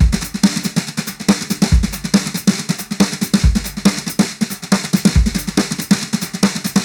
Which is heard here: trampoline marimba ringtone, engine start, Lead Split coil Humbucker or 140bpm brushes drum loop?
140bpm brushes drum loop